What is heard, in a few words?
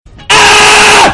rage